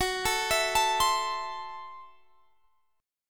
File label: F sharp Minor 7th Flat 5th F sharp Guitar Standard; arpeggiated; JGuitar